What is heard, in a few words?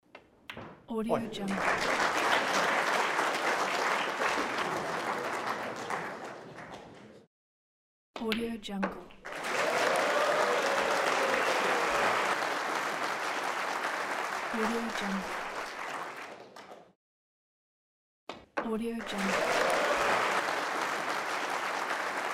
snooker applause